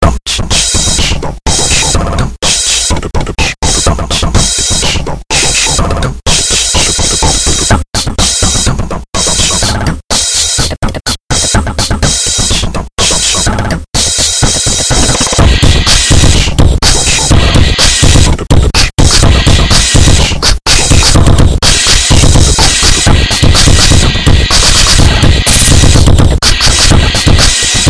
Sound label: draums